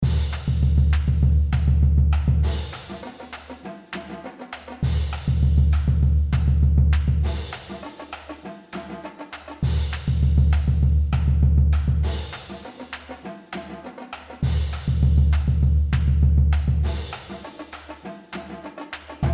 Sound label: Drumline